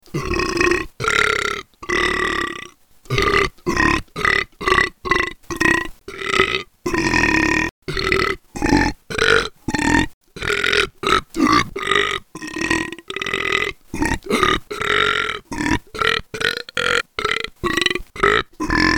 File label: Burp